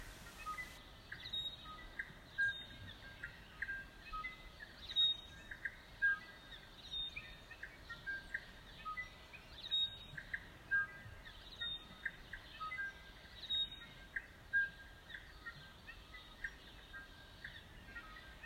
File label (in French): oiseaux hawea, Le réveil des Tui; nz; 5h30; Fermez les yeux, inspirez, et écoutez la Nature qui vous entoure…